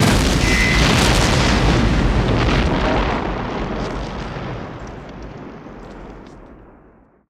EXPLOS; Best Service; Advanced Mega Effects

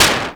Percussive, Drums Perc One Shots

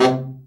BRA Bari, SAX; Best Service Gigapack 1; BR SAX 2

